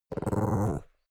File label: chihuahua growl; natur-tiere; hunde